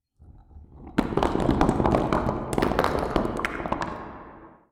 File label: Falling Rock